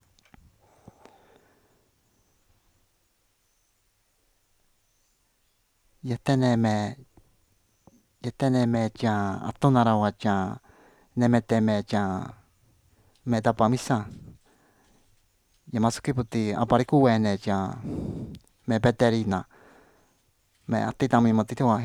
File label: youngsters noise